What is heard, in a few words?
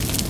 gear enhanced charge loop